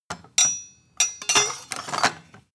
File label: sword handle ring